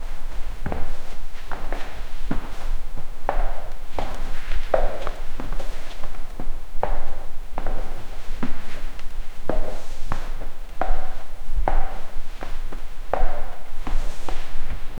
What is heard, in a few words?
distant footsteps echoin